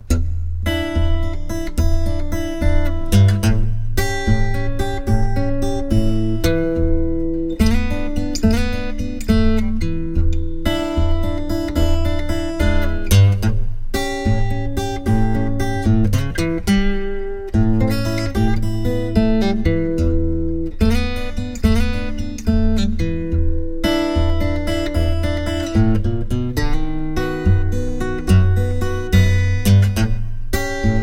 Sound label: dead thumb fingerstyle tunes